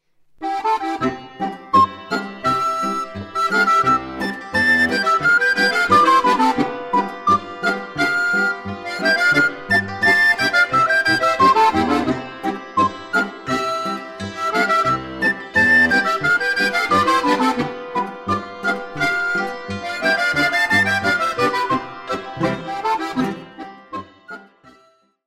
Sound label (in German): Boarischer; Z Berndl Alm